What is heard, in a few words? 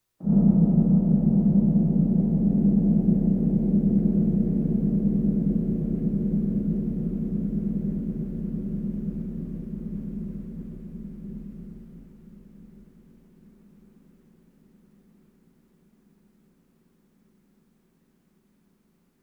Percussion Bongos Tympani rolls Gongs Drums